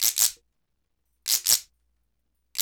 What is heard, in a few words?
BRKDR, Reggae